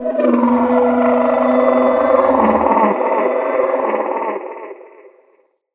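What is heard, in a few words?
Exterior Distant Animal; Midrath Island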